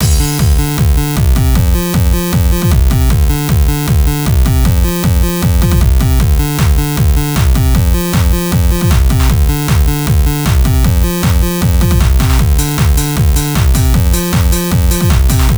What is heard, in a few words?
Music title loop; itgmania